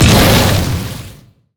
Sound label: bramble zone splash; LoL; zyra